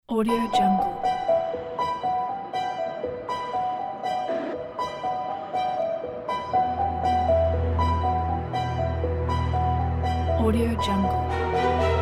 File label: suspense piano